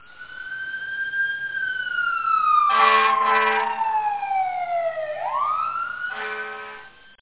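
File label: fire truck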